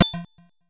Blip, 8-Bit; Games And 8-Bit Kit Volume2; atomsplitteraudio; chiptune

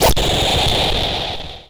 Monster Roar; Games And 8-Bit Kit Volume2; atomsplitteraudio; chiptune

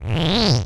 Frog Bass, 8-Bit; Games And 8-Bit Kit Volume2; atomsplitteraudio; chiptune

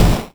Snare, 8-Bit; Games And 8-Bit Kit Volume2; atomsplitteraudio; chiptune

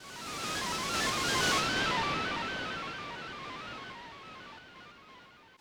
Creatures, Lo Fi Drum

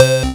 Lead, 8-Bit; Games And 8-Bit Kit Volume2; atomsplitteraudio; chiptune

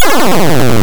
Hard PCM Chip; chiptune

